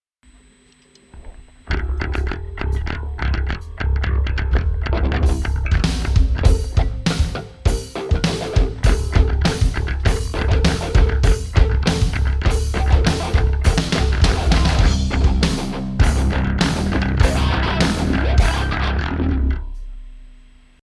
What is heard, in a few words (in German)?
test, Bass Sound